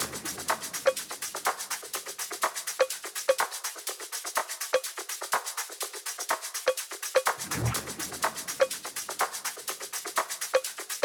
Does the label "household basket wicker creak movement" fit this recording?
No